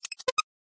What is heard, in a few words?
alert; AirCoding